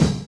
OLD SNARE; Univers Sons

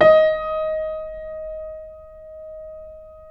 keyboards acoustic D#4; USB Soundscan vol.17 - Keyboards Acoustic; AKAI

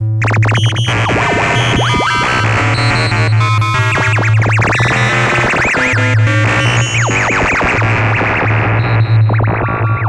mad radio, special FX twisted

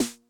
Snare High Tuning Mid Tone Mid Snap, 909; classic machines

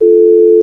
tuners

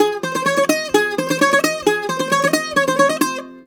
130, BOUZRA130, Mediteranean Traditions; USB Soundscan vol.53; AKAI

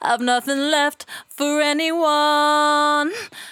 68bpm D, Velvet Vocal; Zero-G

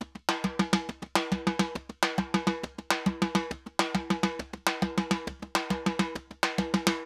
Timba, Festa Latina, Drums & Percussion; Best Service; Festa Latina VOL-1

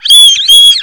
BIRD, bird call; Greytsounds VOL-1; BIRD CALL FX

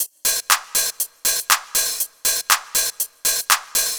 Combo Hat

